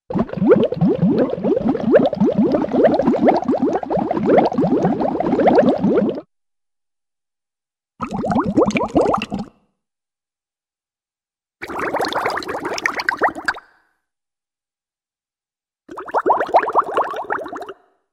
Large Bubbles Rise To Surface w-'bloop' Sound; Hollywood Edge Cartoon Trax

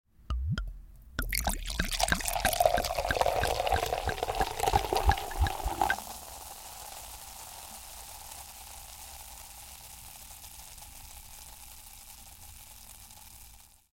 Chugging Soda Pouring Into Glass w Fizz; Household

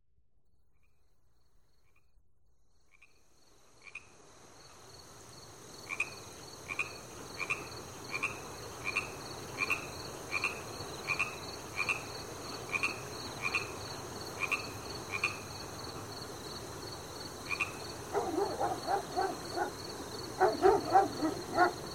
animals at riverside; SOUND EFFECTS1

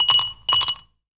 ICE, KITCHEN; 1000fx

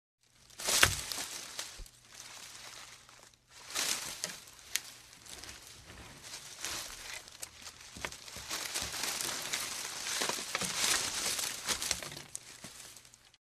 Brush Rustle; Hollywood Edge Foley Sound Library

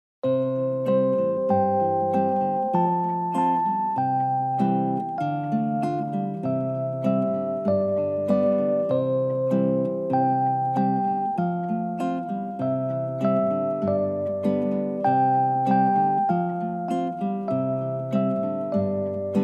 Twinkle, Twinkle Little Star

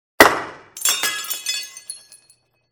Pop and Explosion; SOUND EFFECTS1

